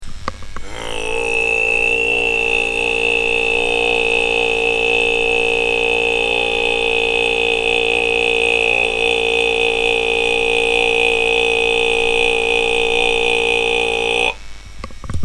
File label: kargyraa